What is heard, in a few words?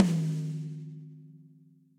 Tom Mid Studio Basic; Drums; Drum Hits; Ableton; Session Drums Studio; MPE